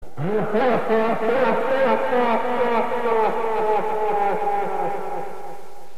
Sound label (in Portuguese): risada; baltan 2